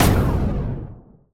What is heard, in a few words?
explosion Quad; explosions; Mindustry